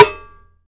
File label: small reverb snare sound g sharp key